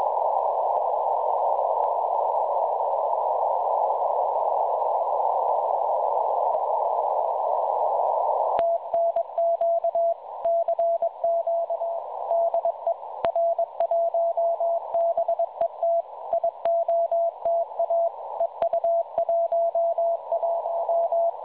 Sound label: websdr recording 7013.5kHz